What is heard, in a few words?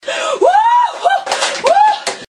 woohoo